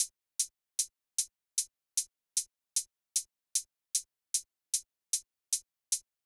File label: Drum Loop 152 BPM Hihat, Hip Hop; Drum Loops; Loop Stems; Cymatics; Truck Drum Loop